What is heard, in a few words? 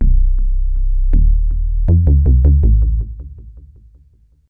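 synth bass, ambient, 160, C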